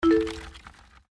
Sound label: hit